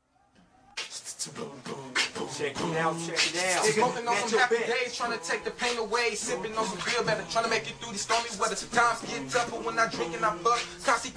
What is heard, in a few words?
freestyle rap